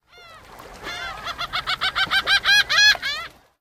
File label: random gulls; random amb sounds